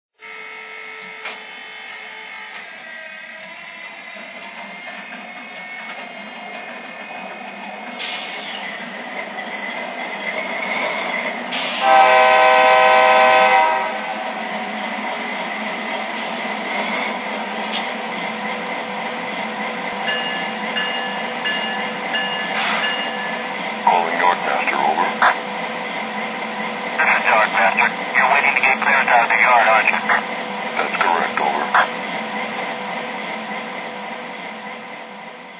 dash8

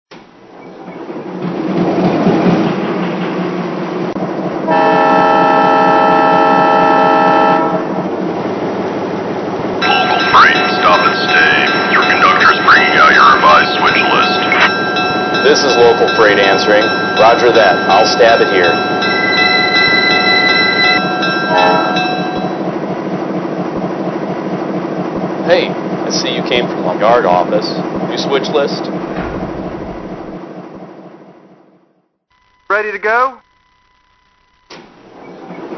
SW-1, trains

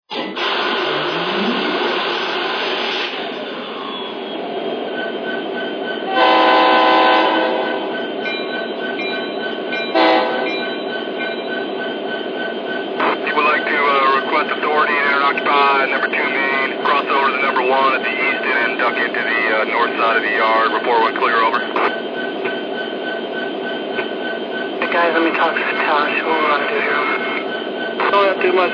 SD80MAC, trains

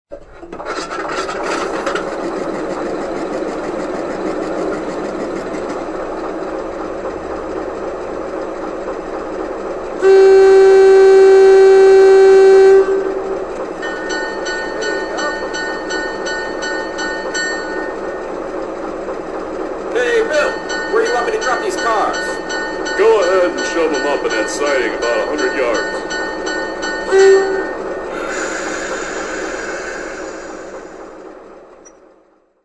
GE 44Ton

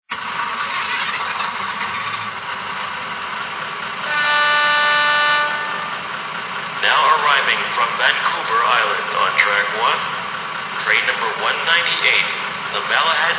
budd; via

